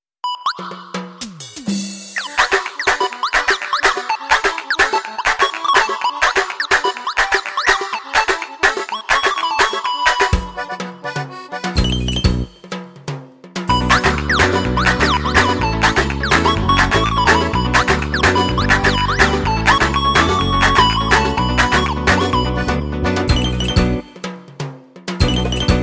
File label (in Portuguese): rumba portuguesa